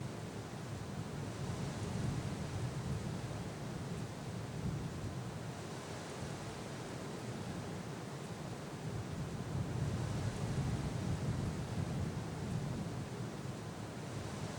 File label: ROADSIDE